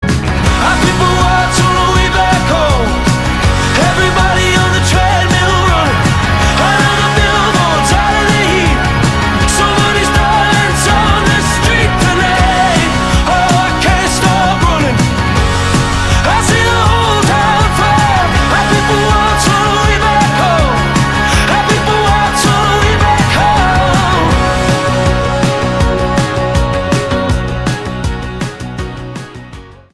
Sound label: Ringtone